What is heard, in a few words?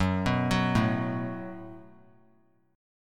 Gb Minor Double Flat 5th Gb, guitar; arpeggiated; 3C,3F,3A,3D; 18,18,21,19